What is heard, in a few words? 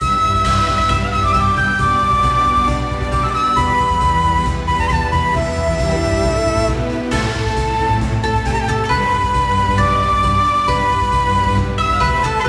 ninja action, music; Parachute Ninja